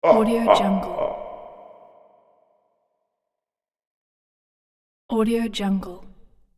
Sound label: tyrant laughter